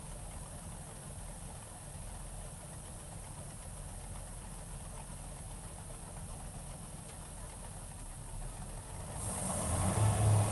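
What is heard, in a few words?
scarab 34 onboard startup accels shutdown engine back wall; Sonniss GDC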